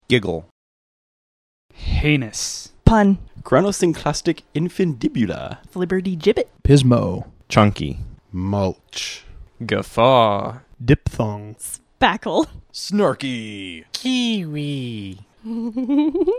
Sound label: giggle